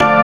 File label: SYN ORGAN, dance synth; Sound & Vision - Gigapack I CD 2 (Roland)